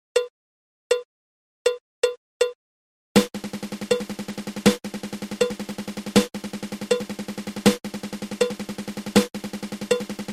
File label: Fifteen Stroke Roll